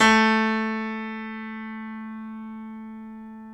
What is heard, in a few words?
PNO, Grand Pno, Roland; Club-50 - Foundations Roland; YPno 1 S